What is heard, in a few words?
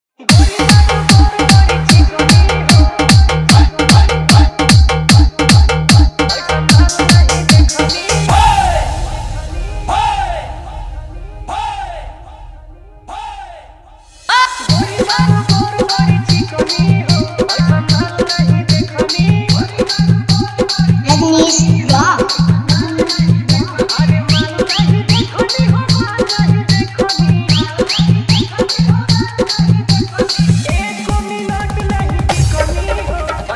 Hard Bass Mix